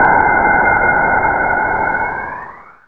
noise; SoundFactory; FX

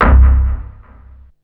BASS; SoundFactory; SMPMANIA

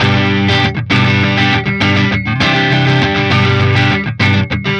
Electric Guitar, Loops; Guitars

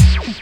Kick One Shot, Drums

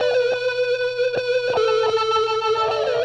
Processed Guitar, Loops; Guitars

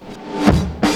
amb loop; pitchshifter PSI

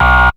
DIRT HIT, STAB; SoundFactory; SMPMANIA

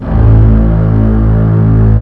FAT BASS; SoundFactory

